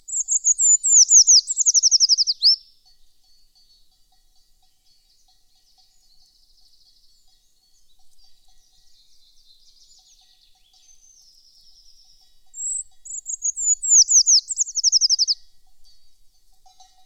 Trädkrypare

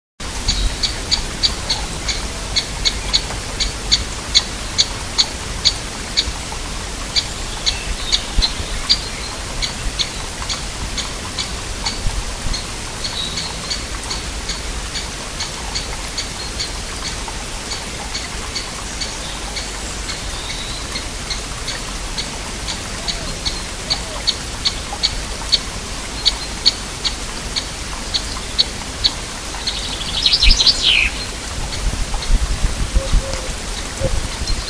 Mindre hack

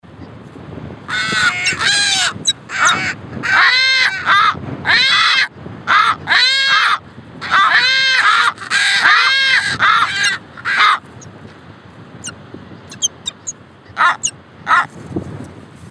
Strimcaracara

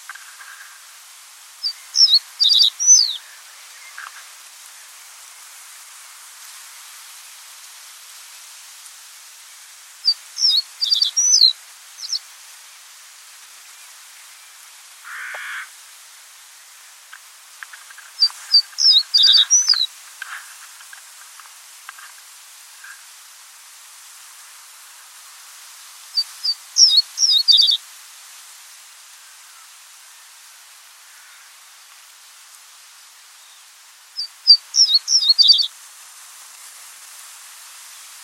Sävsparv

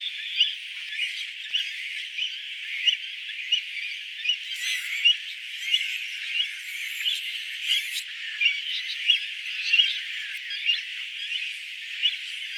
Dvärgskarv

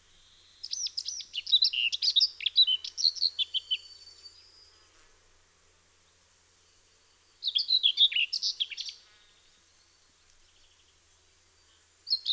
Törnsångare

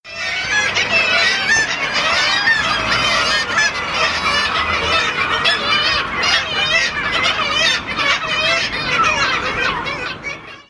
Tretåig mås

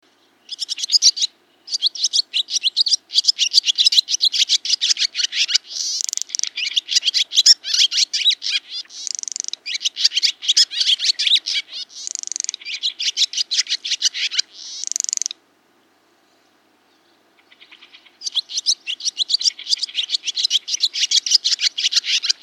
ladusvala

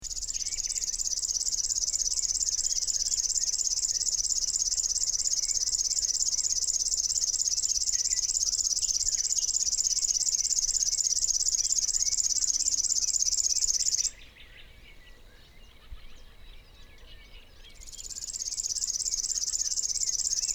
Flodsångare

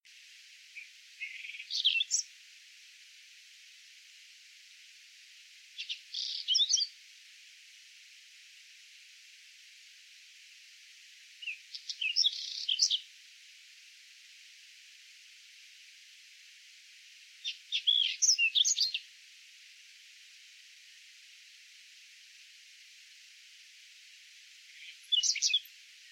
Buskskvätta

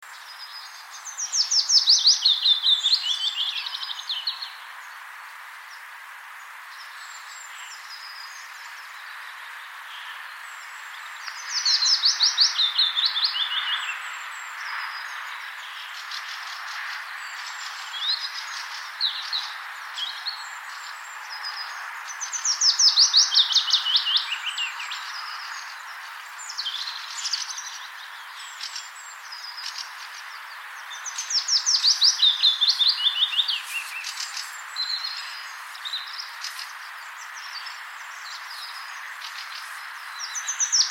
lövsångare

